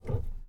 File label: steer back; Blind Drive